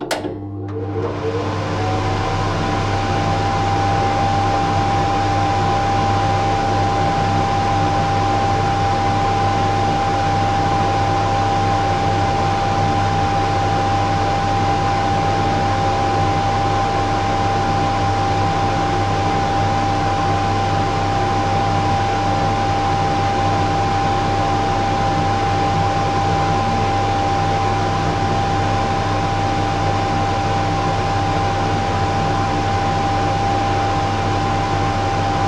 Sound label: Extractor Fan Kitchen; Extractor Fans